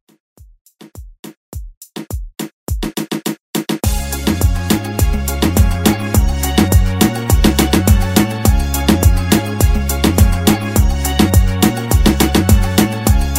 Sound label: Reggaeton Redrum